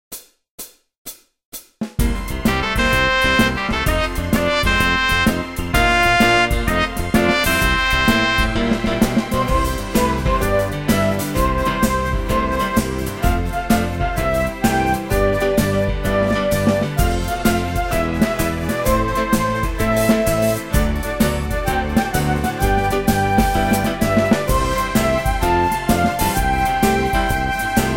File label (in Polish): hymn wersja instrumentalna